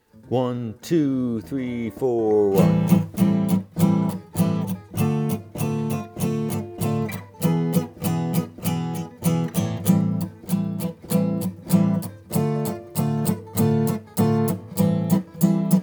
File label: Rhythm, Guitar